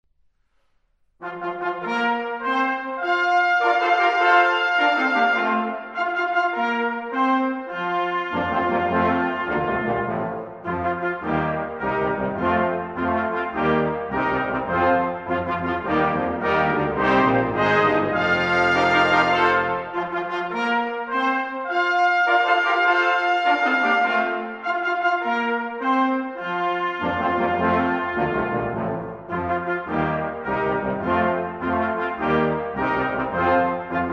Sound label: fanfare; riegler